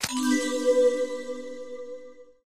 cake down; tangguo

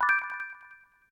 whistles; zuqiu

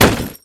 hit Crash

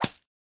hit

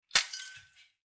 ice

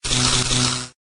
snd tesla

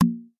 box normal; game; brainie

